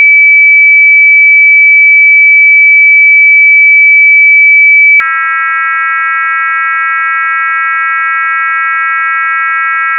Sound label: start tone